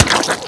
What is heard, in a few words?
gib; gusanos promode